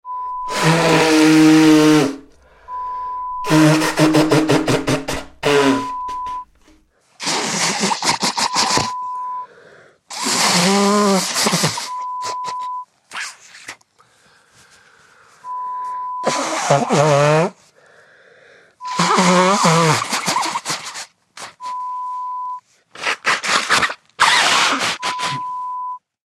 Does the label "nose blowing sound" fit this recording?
Yes